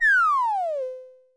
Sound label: banana fly; tf2ware ultimate; mariokart